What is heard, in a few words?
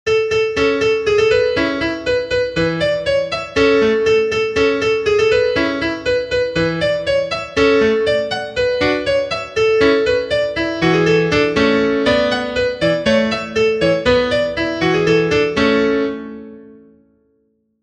Latvian Polka; Artobolevskaya